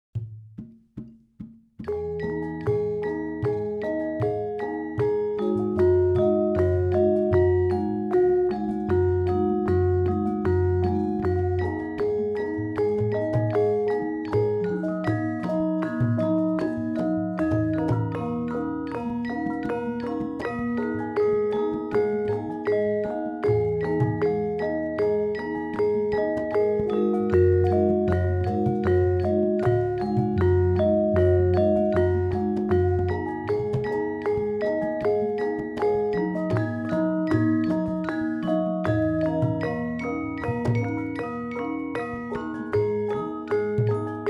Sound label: sampak, gamelan, central java